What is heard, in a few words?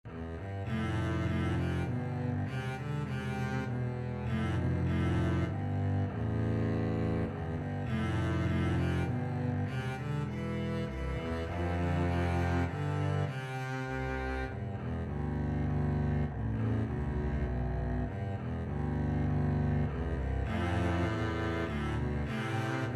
double bass duet